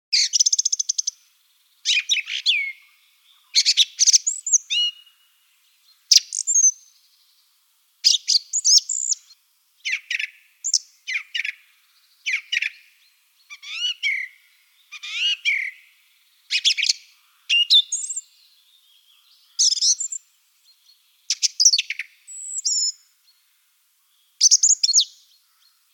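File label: pevchiy drozd golos, song thrush voice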